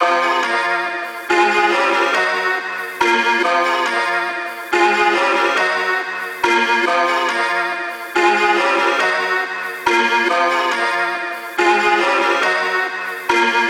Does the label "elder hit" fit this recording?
No